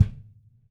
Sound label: METAL; ILIO - Ethno Techno